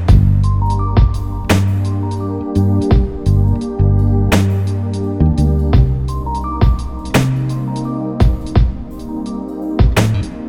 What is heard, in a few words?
Lofi Trip Hop Beat